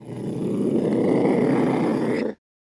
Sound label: Angry wolf snarling